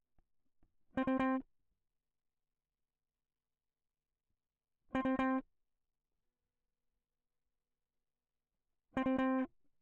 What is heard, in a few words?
electric